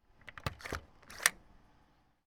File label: credit card swipe